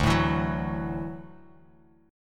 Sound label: D-Major Add 9th D down Bass Standard; strummed; JGuitar